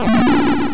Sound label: transform; smw; smw1.7